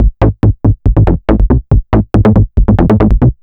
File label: bassline, dance